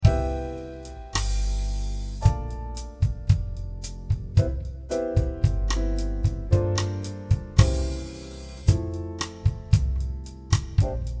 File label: Trio